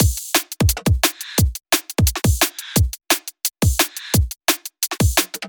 Drums Lo Fi Before

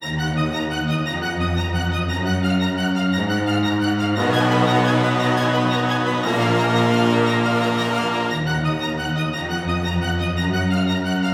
116 BPM Ebm; Phantom

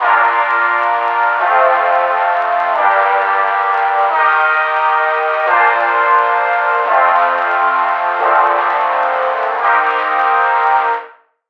Chords Full Reggae; midi wizard 2; Unison

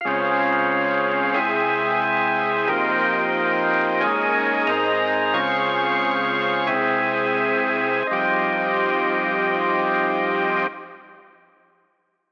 Chords Full Jazz; midi wizard

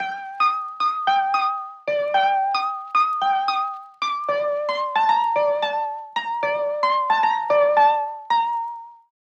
Melody Lead Latin

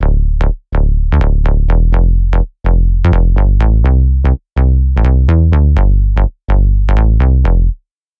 Dm 125bpm

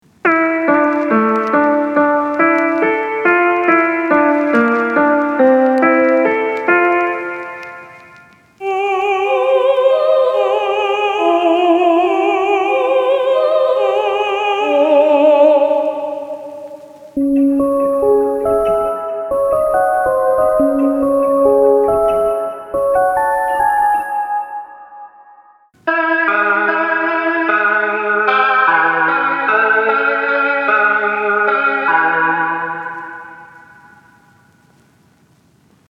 Melodies UK Drill UK Grime

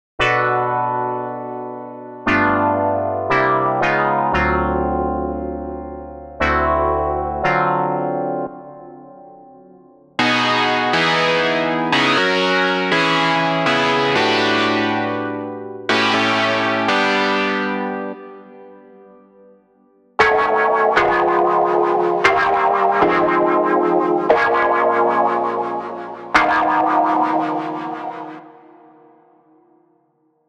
Chords Funk

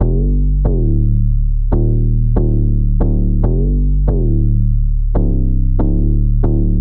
Trap

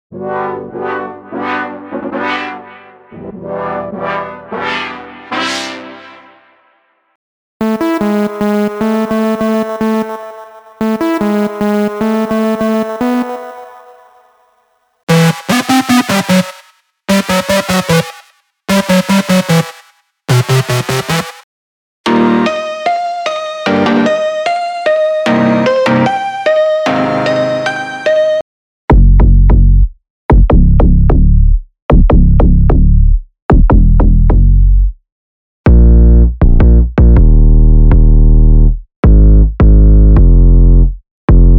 Future Bass & Melodic Trap; unisynth

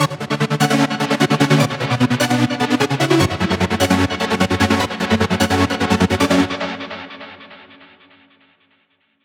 Hybrid Advanced Hardstyle; midi wizard 2; Unison

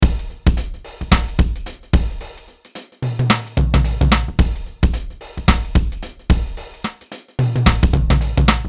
Gospel 110BPM

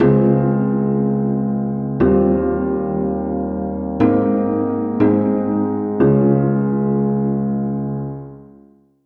Ab Major Prog

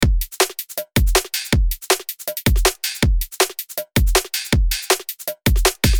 Drum Bass 160BPM

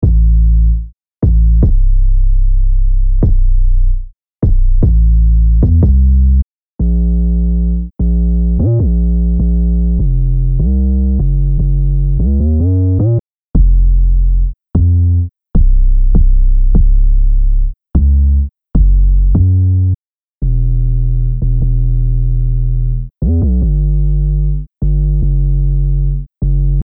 RB 808

